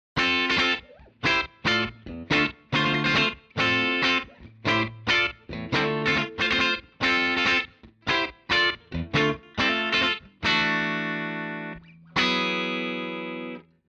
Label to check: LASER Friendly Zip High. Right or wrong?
Wrong